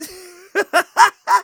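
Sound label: Scout laugh happy, German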